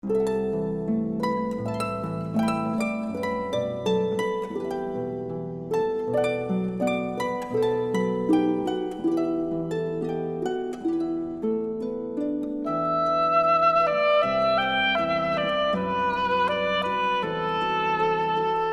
Chinese Folk Songs

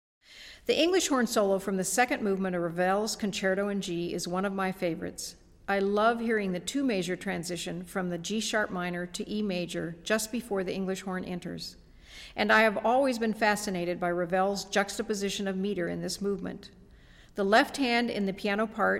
Piano Concerto In G, English Horn; Orchestra Pro Series - English Horn

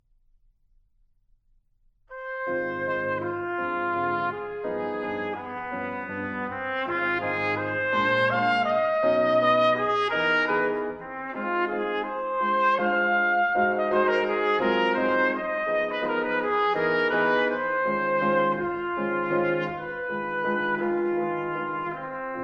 Vocalise, Trumpet Vocalise

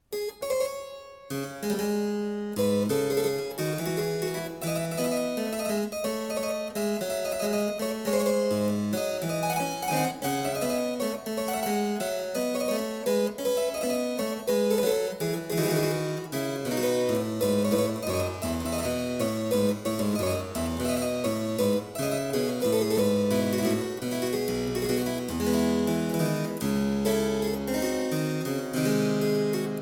Suite I in D minor Gigue, Harpsichord; Music for Harpsichord

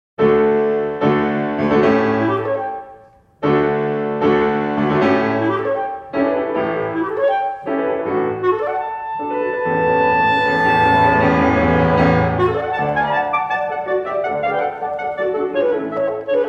Violin Concerto

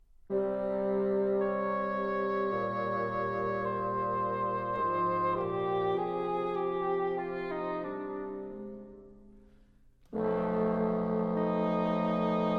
Quintet, Praeludium, Woodwind Quintet

